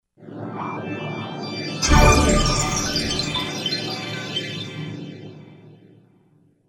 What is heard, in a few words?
Christian Classic - Bed